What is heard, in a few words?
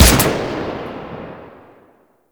Grineer Emplacement Shot; Weapons